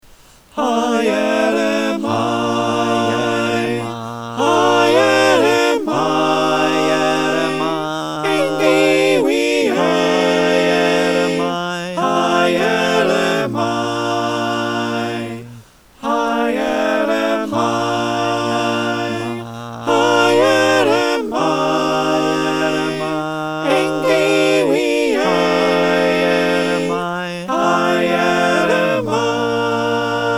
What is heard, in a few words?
SATB